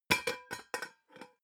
pot lid drop close long; objekte; topf

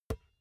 basketball catch finger tips soft

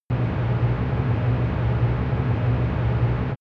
spaceship engine on load phase; raumschiff

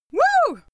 female cheer woo; schreie; menschen

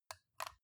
metal device click lock in soft; industrie-wirtschaft

